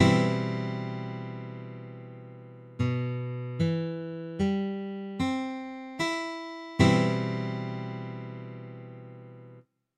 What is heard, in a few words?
CB, Guitar Chords